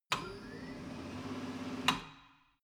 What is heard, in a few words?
tire changing machine turning platform